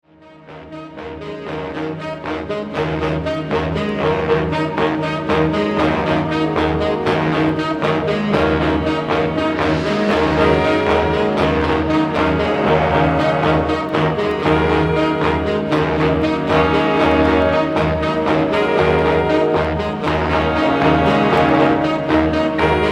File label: urban sax